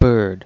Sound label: bird